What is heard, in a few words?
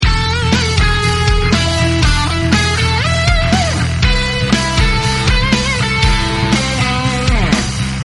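sample with rhythm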